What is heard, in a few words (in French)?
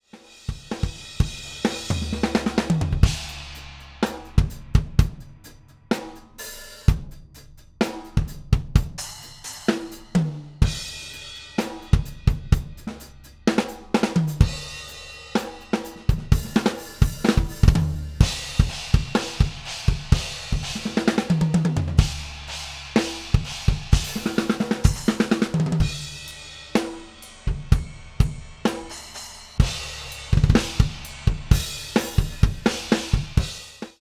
batterie non traitee; rupert neve portico